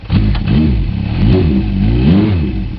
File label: engine; race